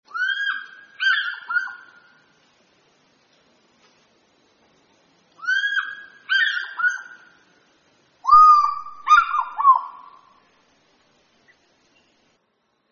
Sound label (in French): pygargue vocifere, chant